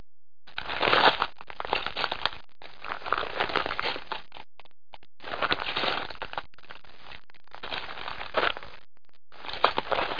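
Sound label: footstep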